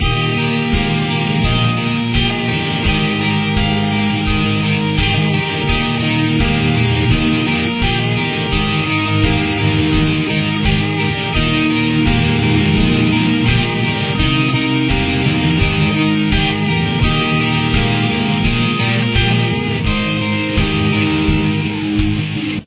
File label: full lead parts, Here’s the full solo; guitar